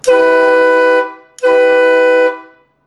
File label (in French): son klaxon train americain trompes usa 4 trompes chromees 130 135 db